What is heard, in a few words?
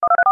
DTMF TX; Zello Android Gateway